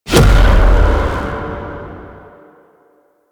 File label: Focus Blast; Warframe; Zenurik